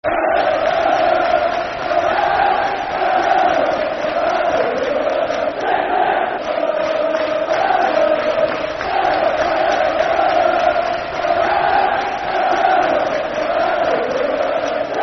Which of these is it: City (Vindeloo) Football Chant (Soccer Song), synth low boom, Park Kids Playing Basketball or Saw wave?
City (Vindeloo) Football Chant (Soccer Song)